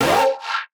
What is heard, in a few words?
Retro FX; Cymatics; Video Game Sample Pack Vol 1